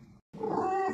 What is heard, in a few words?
meow